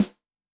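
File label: Snare Ambience, Snare; Yamaha DTXpress-II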